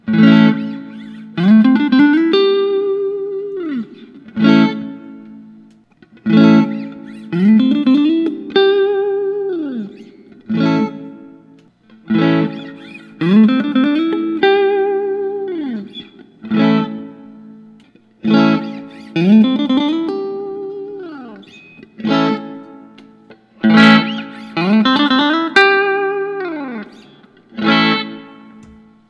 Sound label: fender custom shop; cunetto era 50